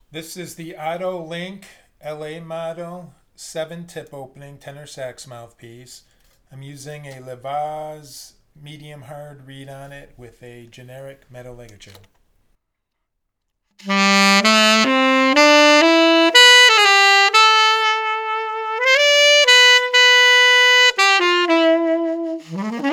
tenor saxophone mouthpieces; OL LA 7; ellis music in vermont

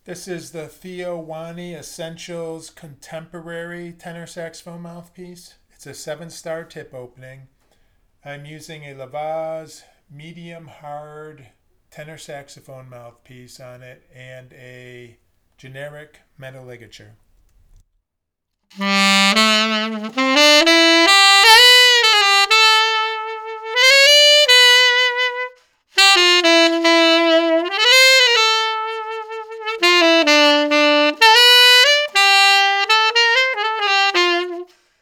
tenor saxophone; TW Ess Cont 7 Star; ellis music in vermont

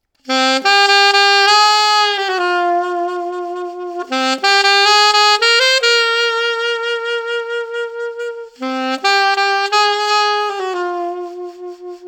Metal Alto, alto saxophone mouthpiece; BS Burnin

